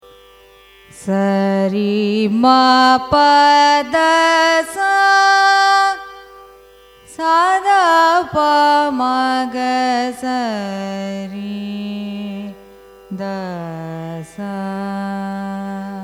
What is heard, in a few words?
raga kalavati arohanam avarohanam; carnatic music